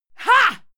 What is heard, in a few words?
female fight attack hit